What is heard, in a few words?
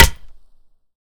Hammer Nails; zps